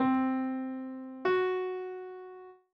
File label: E5 mel